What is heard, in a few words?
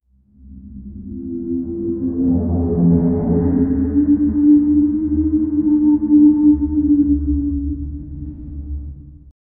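horror background sound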